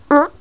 simple, prout

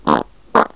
double, prout

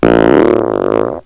lent, prout

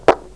ridicul, prout

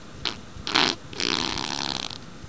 chiasse, prout

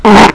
foireux, prout

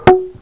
prout; bocal

